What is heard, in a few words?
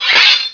SWORD